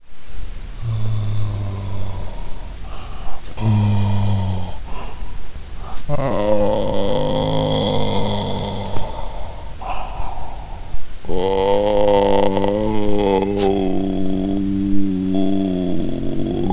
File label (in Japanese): zonbi